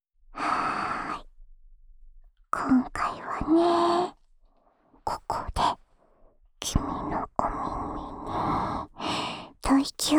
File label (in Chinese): 杏朱的吹耳 左; ASMR版